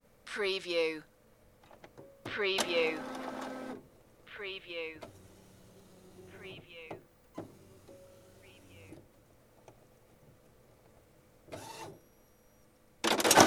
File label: printer printing fast